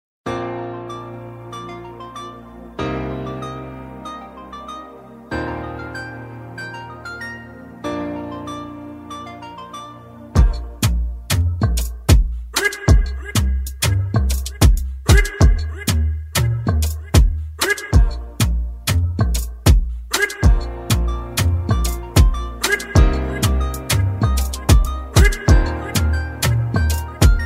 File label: Instrumental